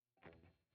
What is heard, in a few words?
Guitar 2